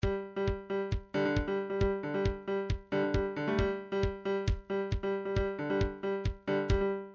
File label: trance midi loops beats sfx tribe; Newtronic Trance Constuction Kit